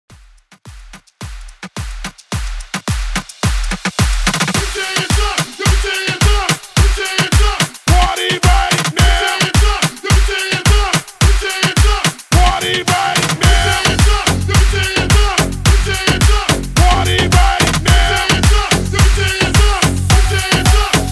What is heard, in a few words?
MOOMBAHTON HYPE 108 BPM